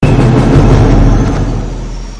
drum

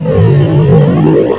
bp alert, baby panther

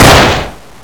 xm8 fire, weapons

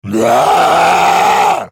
infec enspotted

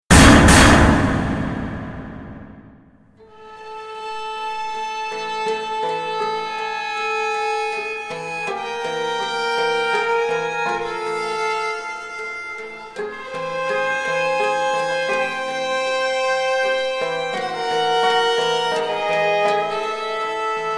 game failure; sven

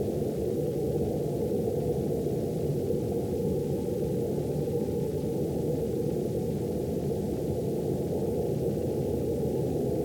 wind tunnel; legend of myst jump

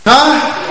huh

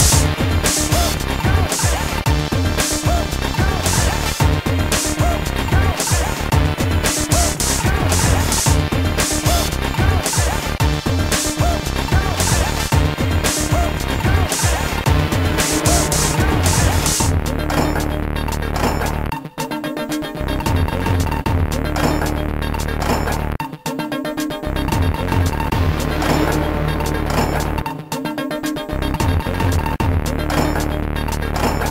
bgm music loop

